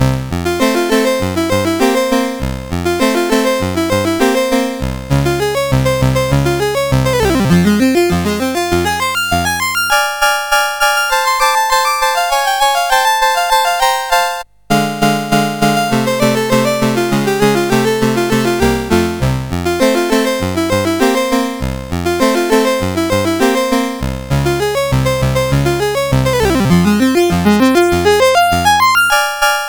Maple Leaf Rag, CSG8580R5; Roger Ambill

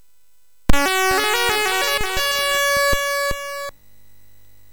Music Demo Version, MOS6581R3; hvsc

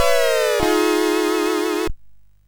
Boing, MOS6581R3; hvsc

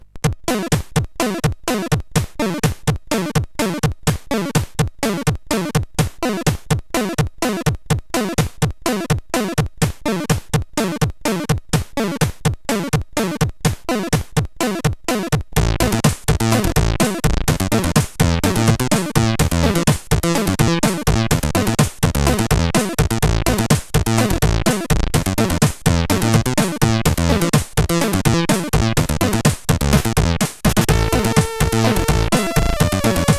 Dance Tune sid CSG8580R5

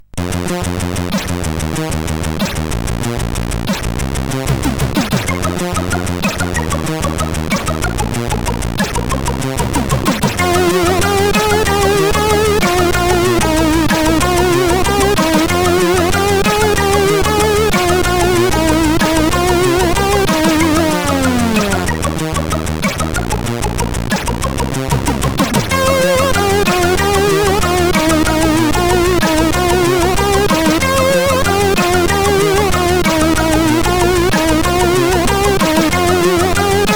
Beatsystem, CSG8580R5; hvsc

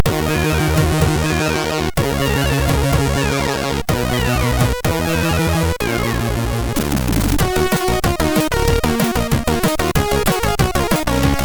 Groove, MOS6581R3; sid

